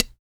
MALE PERC, Vocal Planet, Jazz FX; Spectrasonics

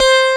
C4 TELECASTR, Bass Guitar; AKAI S6000 CD-ROM - Volume 1

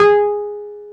PNO, Wurly

